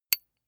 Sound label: small metal impact medium high damped; industrie wirtschaft; metall